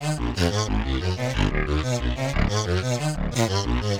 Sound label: DIDG LOOP; Bolder Sounds - Granular; 120BPM LOOPS